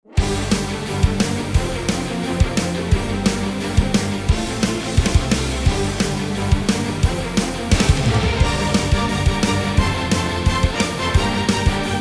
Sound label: Gameplay; Everybody 1-2-Switch!